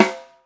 snare drum f sharp key

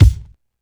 shiny kick drum sound G key

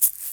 original shaker d sharp; percussion

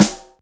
dry snare drum g sharp key; sample; Duration 0:01; Samplerate 44100 Hz; Channels Mono

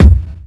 Smooth Bottom End Kickdrum Sample C Key

